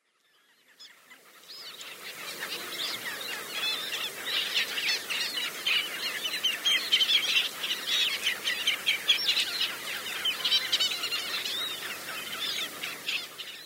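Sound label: Common Shelduck; saga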